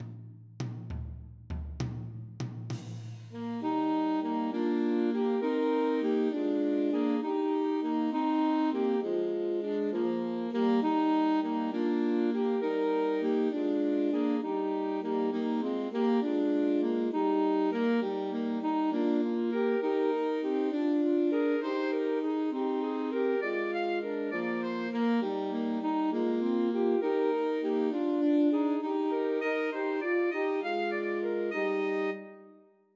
I Saw Three Ships Christmas Trios for Three Alto Saxophones